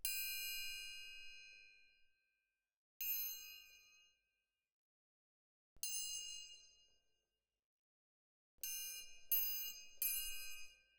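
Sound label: Percussion, Jazz for piano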